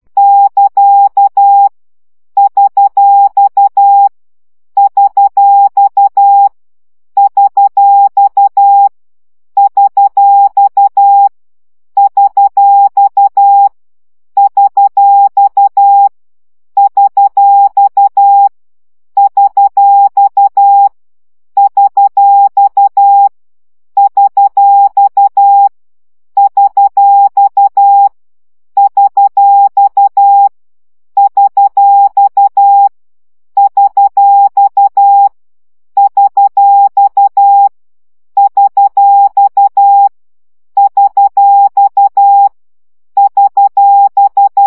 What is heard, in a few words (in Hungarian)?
CW gyakorlas dollar 10 baud